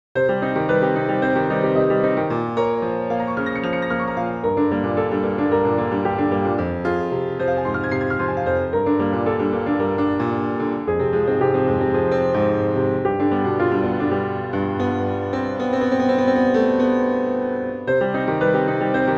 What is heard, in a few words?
miniature in jazz, piano; pieces pour piano